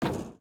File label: tin, step